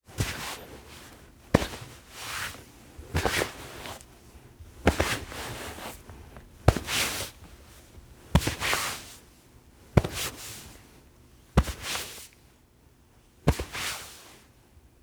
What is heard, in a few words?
Cotton Clothes Grab Cloth Rustle and Pat; Foley; Foley Essentials; Foley - Misc